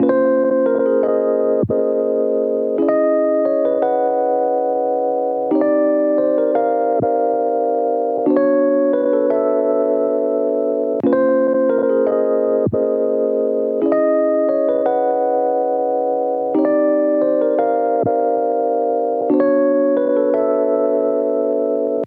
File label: E Piano - 87 BPM F Min; Melodics; Cymatics; Eternity Sample Pack